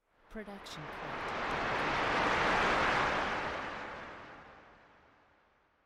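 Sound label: sci fi ambience; soundscrate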